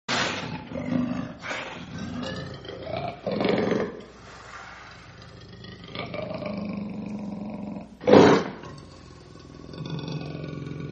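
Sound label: luiperd growl ringtone, leopard growl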